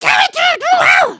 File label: failed build; emotes